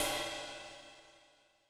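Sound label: Ride Sizzle, Cymbal; Yamaha DTXpress-II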